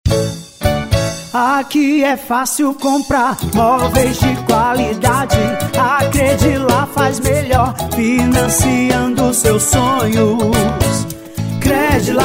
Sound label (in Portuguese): jingle; credlar moveis